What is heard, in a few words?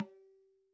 dhin, mrid